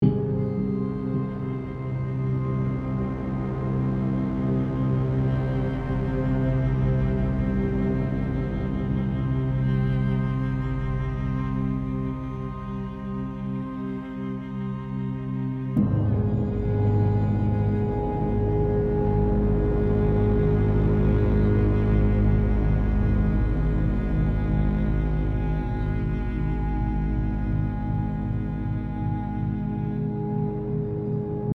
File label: Tense (Loop)